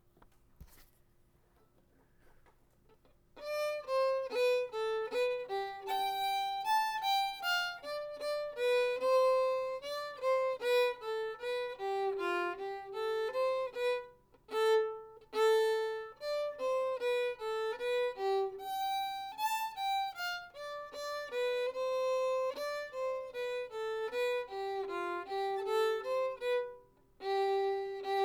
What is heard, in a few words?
slow, fiddle